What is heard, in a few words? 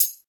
natural hat one shot A sharp